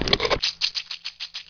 zombie poison idle

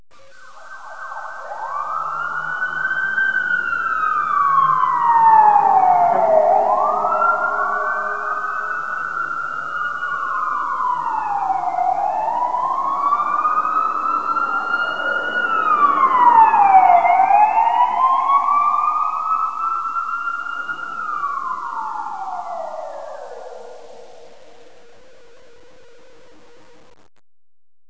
fire siren

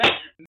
mousetrap

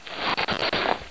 crawl

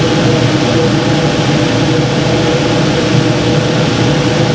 blower in, highbay ambience

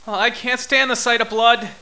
scared

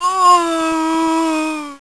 zombie female die

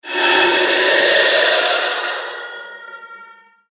present spawn

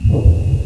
heal

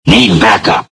alert

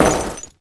jump metal

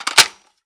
mp5 mag in, weapons

